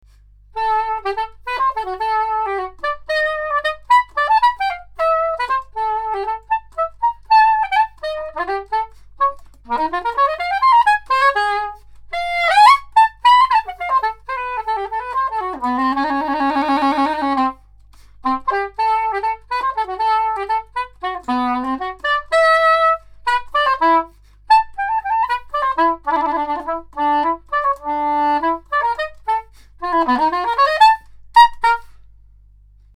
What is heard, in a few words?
soprano saxophone